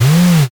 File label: servo